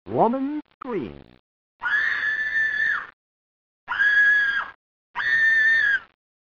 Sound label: women cry